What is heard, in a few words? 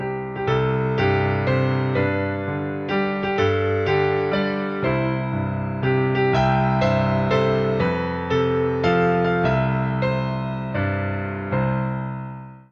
happy birthday, music